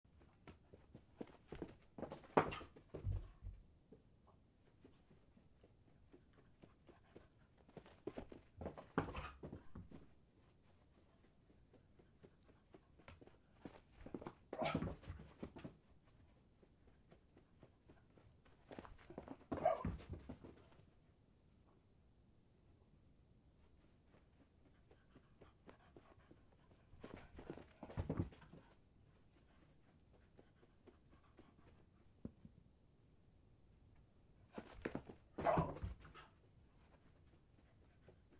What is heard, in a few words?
dog barking playing fetch; DOG IS PLAYING / FETCH